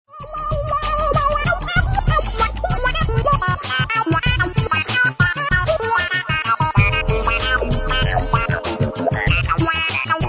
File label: samchillian